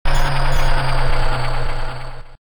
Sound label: moving truck slowing; Pokemon RSE